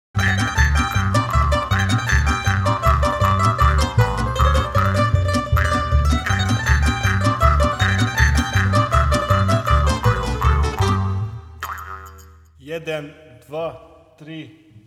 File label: tambura